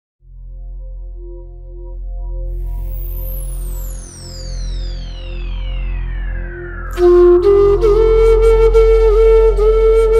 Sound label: Flute